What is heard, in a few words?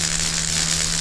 electric loop; zombie plague